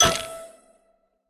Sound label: match sequence sfx; Candy Crush Friends